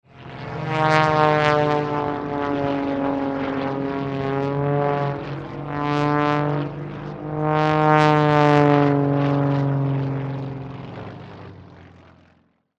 Biplane Fly By Dive Movements; Transportation Aircraft; Airplane

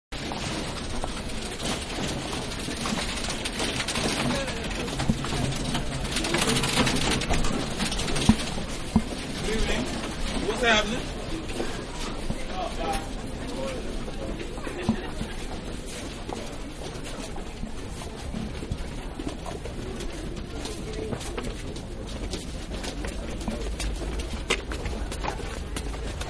Small Departing Platform, Subway; Subway Small-Departing-Platform View, Exterior; Manhattan Production Music

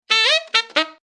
A crazy saxophone riff.; Horn Sound Effects; Musical

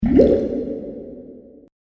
Water Bubbles; Andrew Fleming

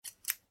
office scissors cut air single; Scissors Cutting Air ( Single Snip ); McKinney Sound

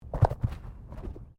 Body movement Tumble Light; Body movement foley to simulate falls and deaths; Rolling; Objects; SoundEffects-Foundation1

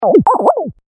Weird Electronic Squiggle, cartoon; Miscellaneous Cartoon Sound Effects; science fiction sound effect; Andrew Fleming

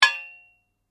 Blade Heavy Impact, Sword

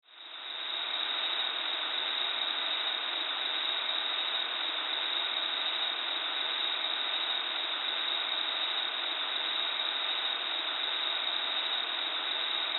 Industry Air Leak Large; Air Pumps; Machines

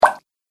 Water Button, button sound effects; multimedia

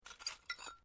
Glass move quiet

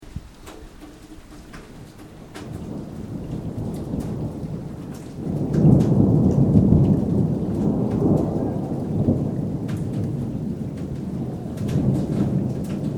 THUNDER LONG RUMBLE RAIN LIGHT; Elemental weather effects

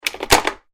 household telephone old reciever place down; Telephone ( Old Rotary Style ) Receiver Placed Down; Electronics; Telephones; McKinney Sound

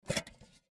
Lunch Box Metal, Lunch Box Metal Small Open; School Sound Effects; Backgrounds; Foley; Blastwave

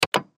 Button Switch; Multimedia Web Interactive; Buttons (Sound Effects:Multimedia; Blastwave; Technology

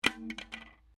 WRECK WHEEL COVER IMPACT GROUND, Wrecked Cars; impacts, crashes, scrapes; Sound Effects:Objects

